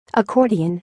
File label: Accordion